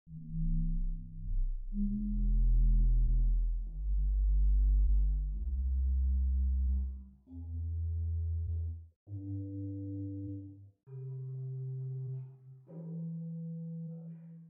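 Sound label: Pedal Sub Bass 16 arpeggio, organ; Hauptwerk; St Annes Moseley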